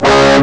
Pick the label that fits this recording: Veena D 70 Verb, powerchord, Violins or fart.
powerchord